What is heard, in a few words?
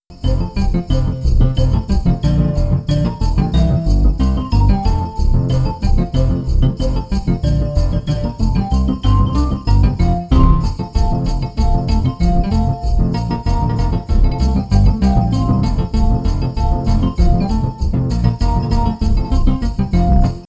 chase; red nosed rake; xmas; slender